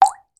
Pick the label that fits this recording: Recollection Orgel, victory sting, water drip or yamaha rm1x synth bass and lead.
water drip